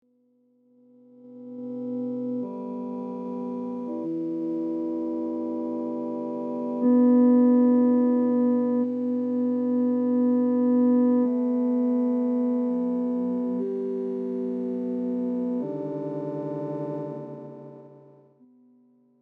Piano Distancer; M4L Bulbs; Szymon Kaliski

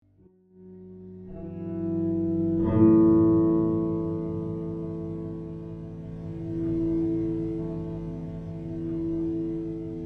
Guitar Slower; M4L Bulbs; Szymon Kaliski

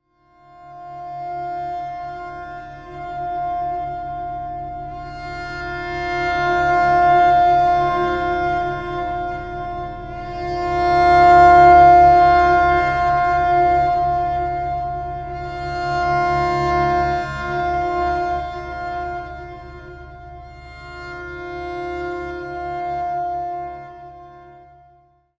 sad violin ambient

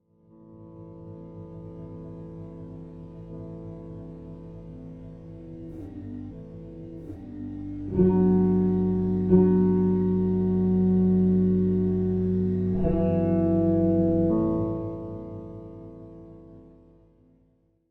Guitar Slower; M4L Bulbs; Szymon Kaliski

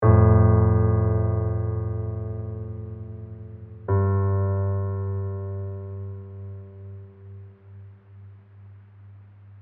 Piano Original; M4L Bulbs; Szymon Kaliski

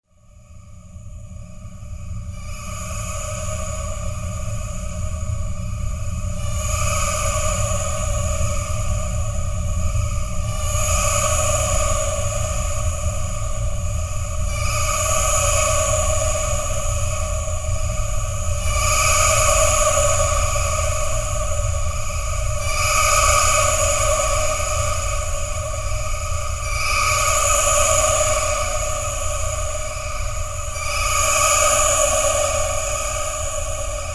vocal ambient

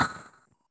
perc noise hit

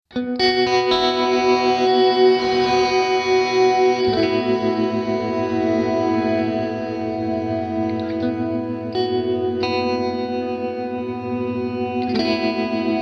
Guitar Slider; M4L Bulbs; Rene Margraff

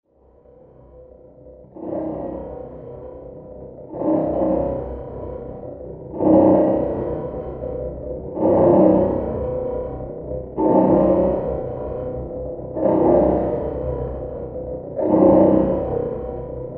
scrunchy ambient

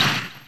snare sines snare

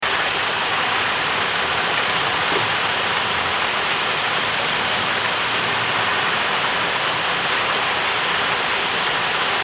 Field Recording Original; M4L Bulbs; Szymon Kaliski

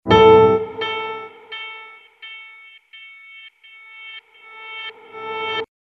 piano fx

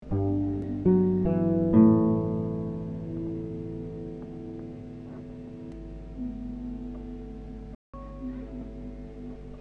Guitar Original; M4L Bulbs; Szymon Kaliski

